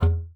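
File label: E3, bass flute; Xsample VOL-2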